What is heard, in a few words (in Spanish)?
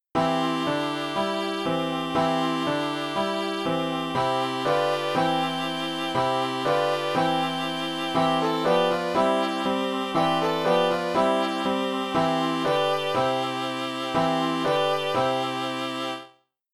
base frere jacques instr en do voz piano